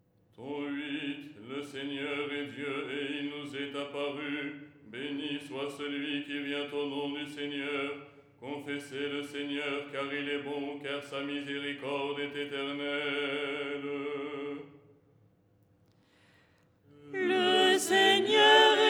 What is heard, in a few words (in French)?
soprano